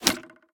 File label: roman ranged bow impact; smite; npc; roman archer